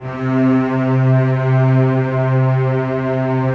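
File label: celli sustain c2; Sonatina Symphonic Orchestra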